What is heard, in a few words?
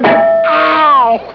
clang